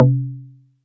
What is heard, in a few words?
Perc C3